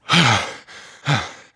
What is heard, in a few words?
water resurface; Heretic II Demo